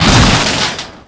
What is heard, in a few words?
hit vehicle; hits; Tony Hawk's Project 8; XBOX